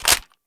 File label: Heavy Weapon Clip In, reload